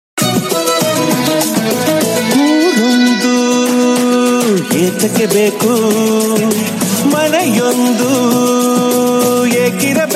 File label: telugu music